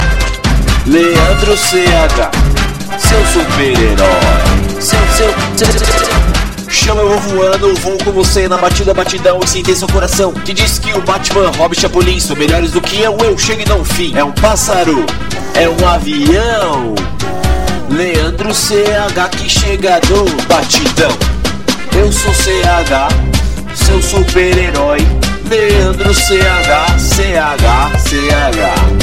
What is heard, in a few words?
funk